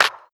hand clap sample a key; single hits one shots

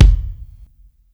loud kick drum single hit f sharp key

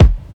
strong bottom end kick e key; kick drum samples

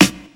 rich top end rap snare drum sample F sharp key

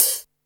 sizzle hihat D key; drum single hits one shots; hat samples

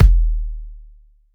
long kick drum sample d sharp key; kick drum samples

